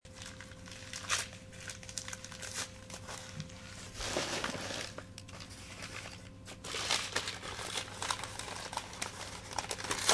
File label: tearing up cardboard; for pee composting